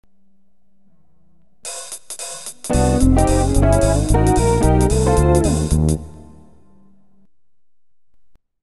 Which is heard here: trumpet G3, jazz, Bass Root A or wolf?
jazz